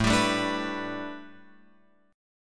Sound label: A 9th Suspended 4th, down, Guitar, Open F; A9sus4 strummed